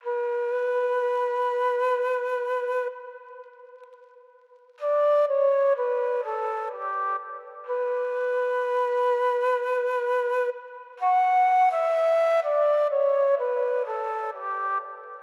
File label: Various Melody Loop - 126 BPM B Min Flute; Loop Stems; Cymatics; Oracle Sample Pack